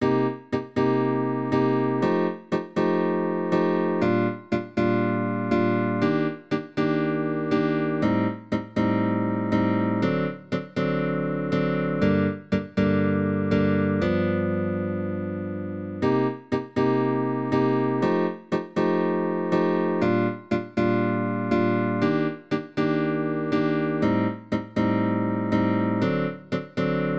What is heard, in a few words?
backing pattern, manouche jazz